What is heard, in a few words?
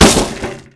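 grass; Mafie 1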